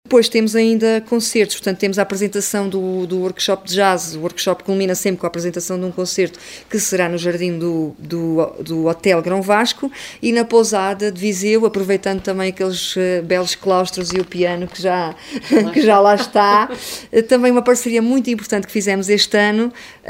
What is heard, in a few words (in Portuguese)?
festival jazz